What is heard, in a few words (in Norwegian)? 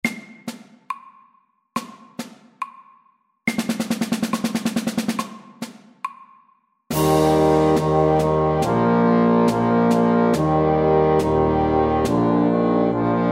Elefantmarsj SLOW 70